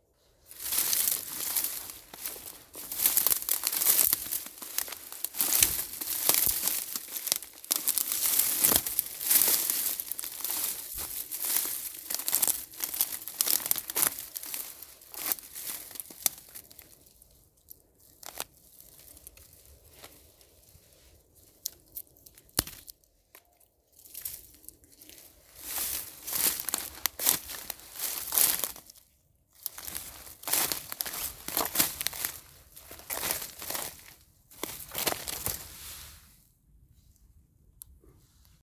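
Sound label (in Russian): making their way through noisily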